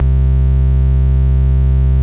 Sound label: bass, Dance Mega Hip Hop Electro; EastWest